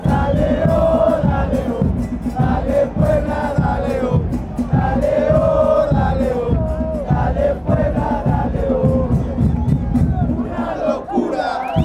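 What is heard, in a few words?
fanchants, football chants; dale oh puebla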